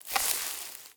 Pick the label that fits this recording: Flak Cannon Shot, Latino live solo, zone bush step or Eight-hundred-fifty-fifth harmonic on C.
zone bush step